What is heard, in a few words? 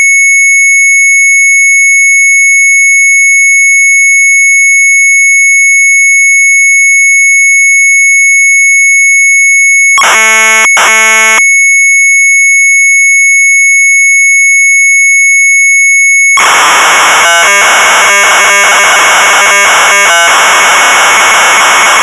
MZ800 Tape; STAAF-CIRKEL; retromelsarchive; sharp mz800